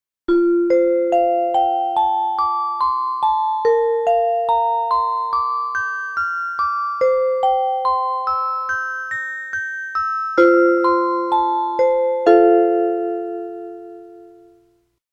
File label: chime; denon